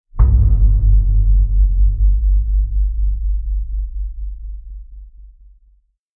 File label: Vast Hit Deep; Ninja Tracks